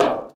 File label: bleacher step; Golden Crayon Sounds